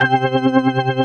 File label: organ, 135 BPM, drum & bass; Ueberschall; Drum & Bass Flash Trackz